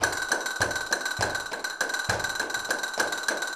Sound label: CASTANETS, Flamenco